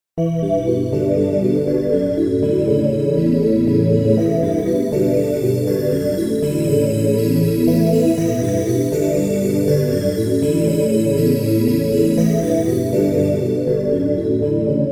Pad; live instruments; Heaven Pad

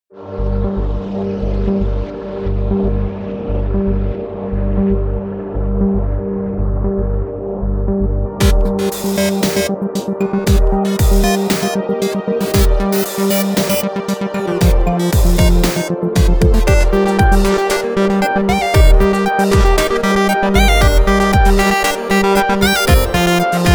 Flying dub

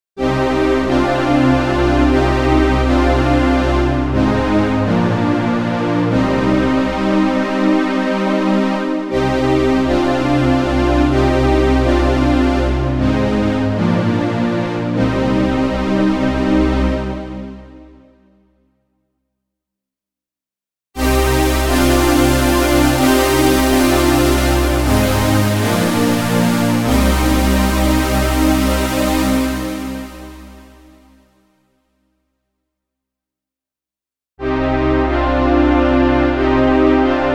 Super strings pad layers; Montage

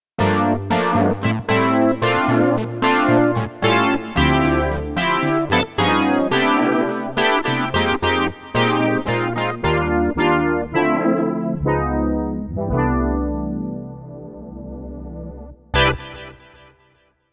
Dx Brass; montage; brass synth

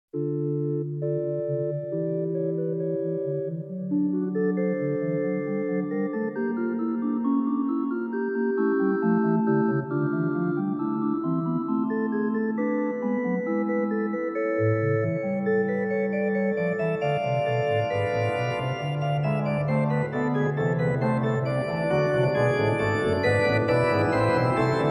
Cathedral; Montage

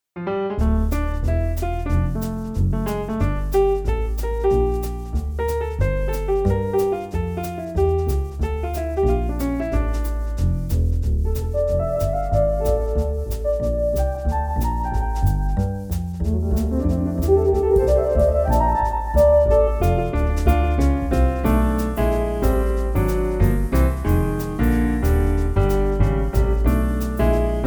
Jazz Trio; Montage; Imperial

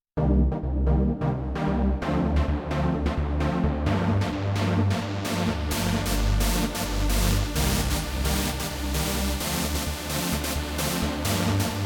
Multi Saw; Montage

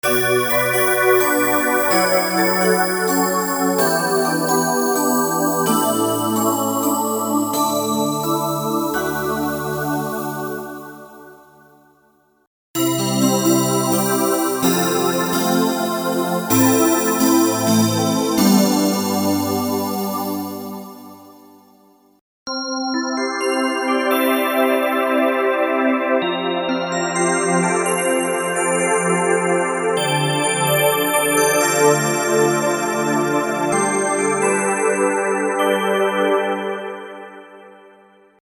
Digital Synth; live instruments

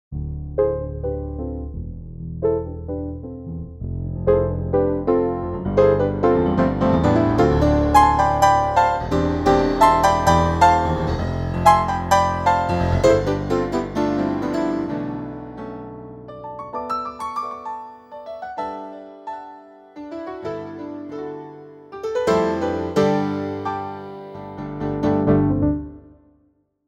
Rock and pop piano CF3

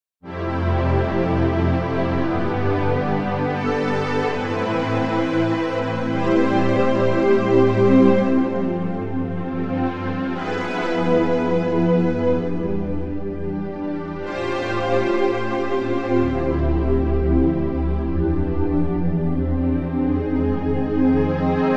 FM syn strings; montage; cordes

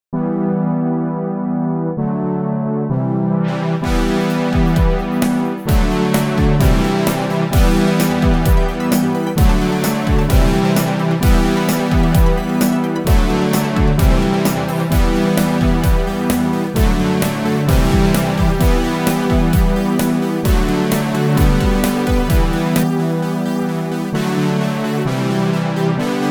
Fanfare and Arps; Montage

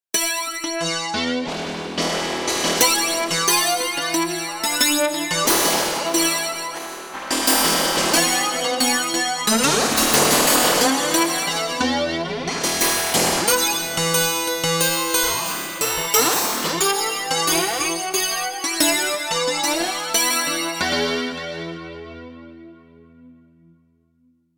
Santur

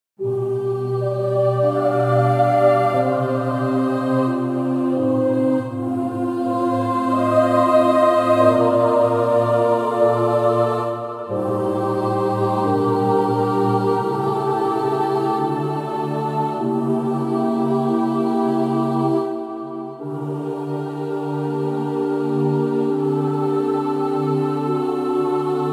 Boys Choir; montage; choirs

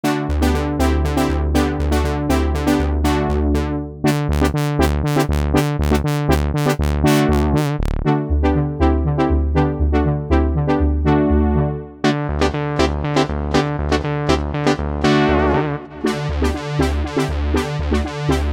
Synth Brass; Montage

